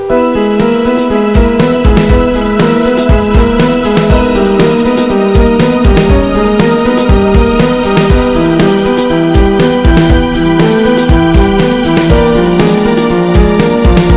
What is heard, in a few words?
end theme; quake2; alien